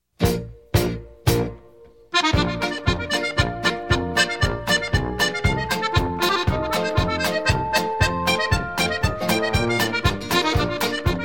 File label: Clarinet Polka